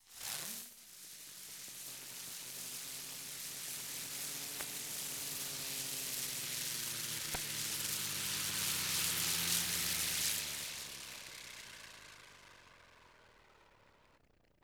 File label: Fireworks Spinner; Weapons & Explosions; Sony Pictures Sound Effects Series Volumes 1-10; Sony Creative Software